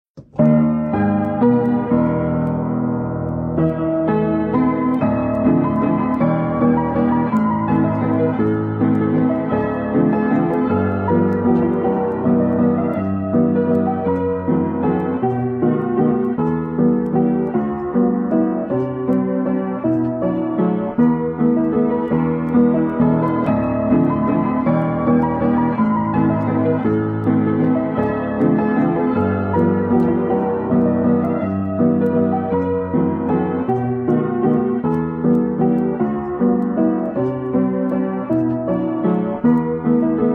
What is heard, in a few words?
Link Instrumental